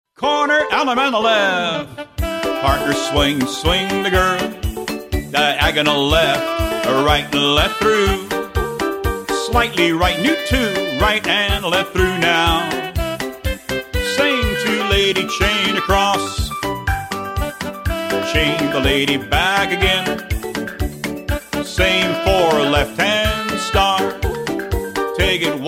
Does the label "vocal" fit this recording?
Yes